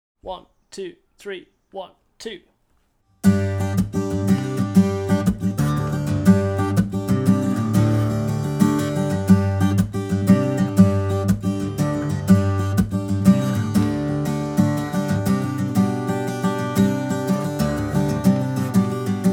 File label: Danish Slipjig, Guitar Full Speed